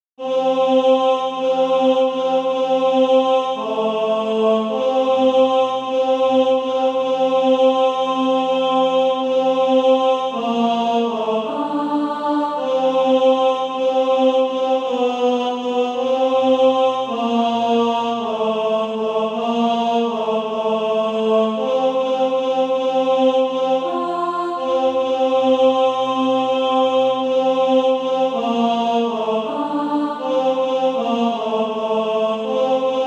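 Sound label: ten, Practise Music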